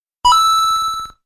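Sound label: coin, the classic 8-bit coin sound; NES in 1985; Super Mario Bros; Mario Coin Sound Clips; collecting 100 coins gives Mario a 1-Up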